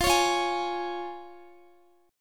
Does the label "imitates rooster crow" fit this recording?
No